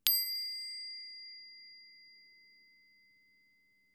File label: smallbowl top sound